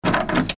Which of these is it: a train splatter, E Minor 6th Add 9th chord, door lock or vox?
door lock